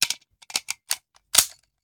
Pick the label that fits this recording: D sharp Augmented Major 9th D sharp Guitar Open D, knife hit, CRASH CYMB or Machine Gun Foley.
Machine Gun Foley